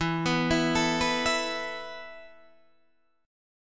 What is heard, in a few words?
E Suspended 4th E, down, Guitar, Half Step Down